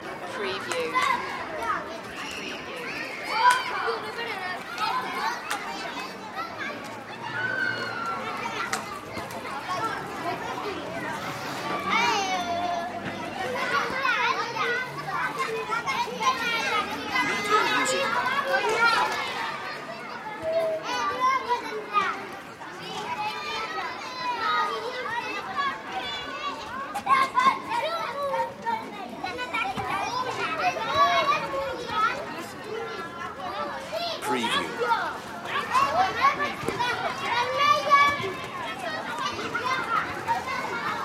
city playground spain; the sound pack tree

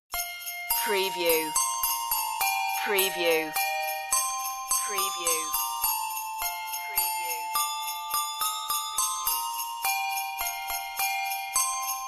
we wish you a merry christmas handbells sleigh

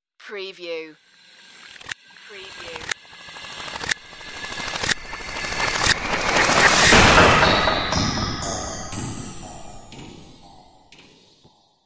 chaotic whoosh impact

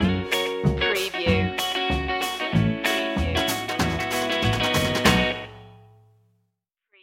rhinestone rambler stinger

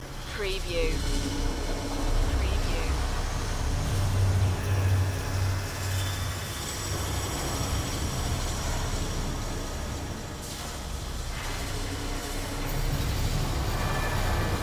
sci-fi industry background loop

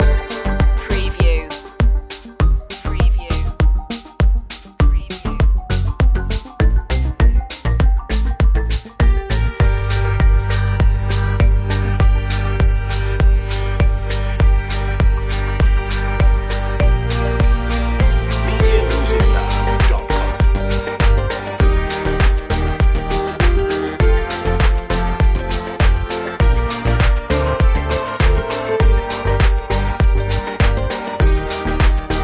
funky poptronic loop

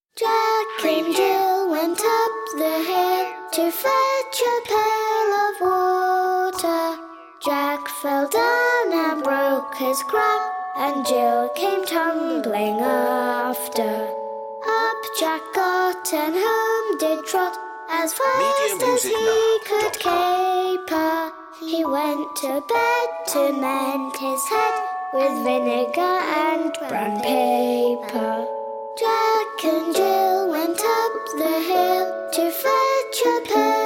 jack and jill children vocals

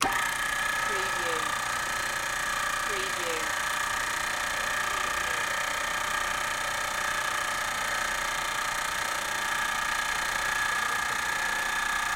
Motor

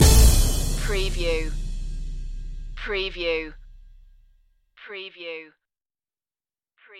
loop ending

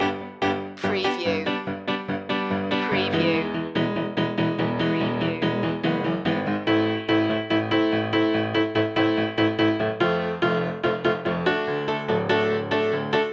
driving in my woody loop

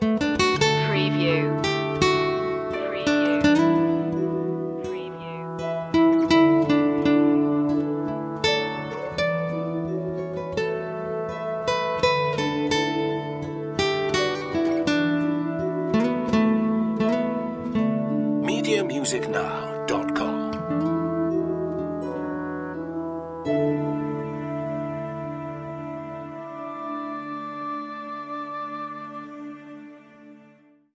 gentle sounding

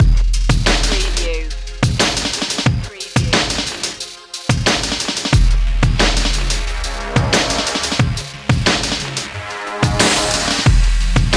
underground bass loop

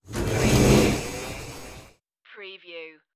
starship door error

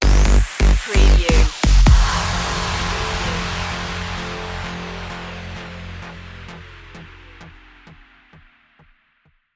jackhammer stinger

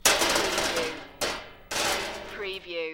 Metal Drag Scrap Clanking

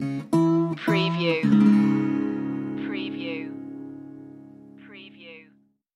blues stinger

